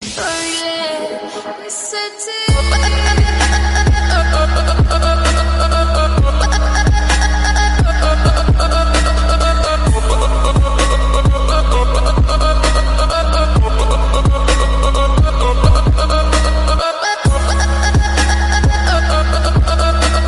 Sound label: trance bgm bass boosted